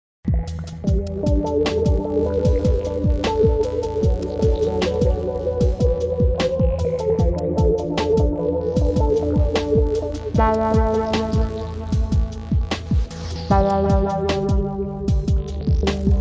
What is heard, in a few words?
Chroma, Roland xp50; synth